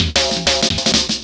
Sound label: Fill Break, Synchro Funk, Beats; Sonic Foundry (Sony Creative Software)